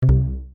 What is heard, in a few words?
platform collision